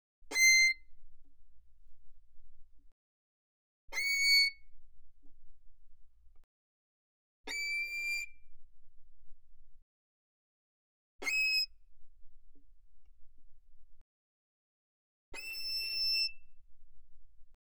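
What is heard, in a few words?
Violin arco fortissimo sul E C7 E7; Strings; violin2012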